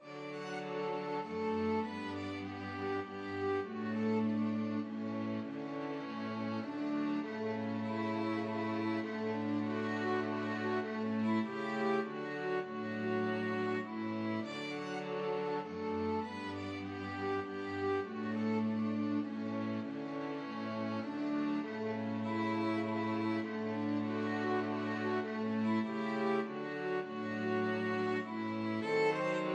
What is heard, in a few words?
string quartet